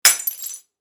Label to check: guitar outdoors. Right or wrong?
Wrong